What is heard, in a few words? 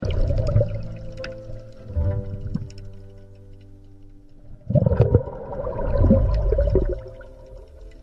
ambient; signal